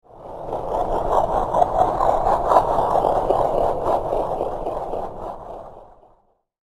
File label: Gritty Horror Ambience With Wind; Scary Soundscape Sound Effects; Andrew Fleming